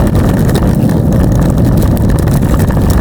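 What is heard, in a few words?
roll concrete rough, terrains; Tony Hawk's Project 8; XBOX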